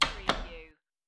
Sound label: Playing Cards Tap Deck Of Cards On Wood Table